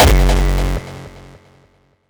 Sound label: impact oneshot; GhostHack